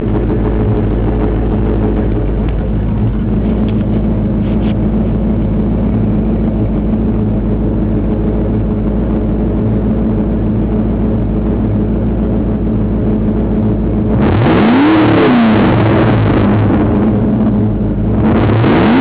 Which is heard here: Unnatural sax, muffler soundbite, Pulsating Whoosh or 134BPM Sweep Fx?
muffler soundbite